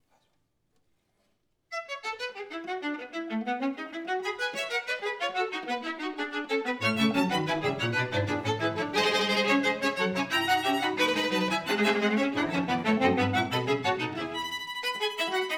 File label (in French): Quatuor cordes Scherzo; Mendelssohn Felix; Op 13; Quatuor Amicis